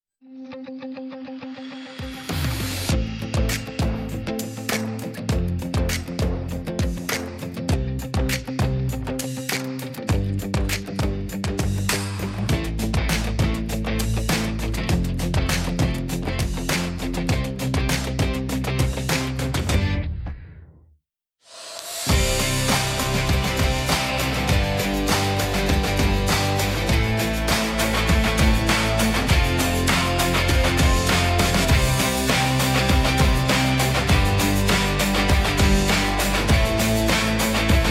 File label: instrumental